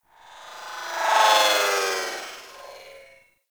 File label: Metallic Textures; Ambience; Warframe; Corpus Railjack